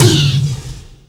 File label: tom wild; USB Soundscan vol.33; AKAI; Disjointed Textures; DRUMATIO